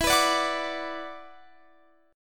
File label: E 7th Suspended 2nd E down Guitar Standard; strummed; capo 9